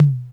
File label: Korg KPR77, Drums